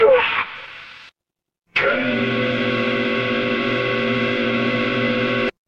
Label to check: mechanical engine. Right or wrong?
Right